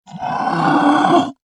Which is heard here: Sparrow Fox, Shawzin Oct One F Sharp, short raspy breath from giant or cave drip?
short raspy breath from giant